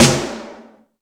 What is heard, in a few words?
Stadium Rox, Drum Machines, E-MU Pro-Cussion